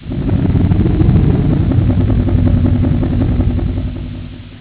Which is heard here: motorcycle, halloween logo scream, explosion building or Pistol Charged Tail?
motorcycle